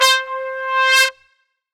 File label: Fast Swell, brass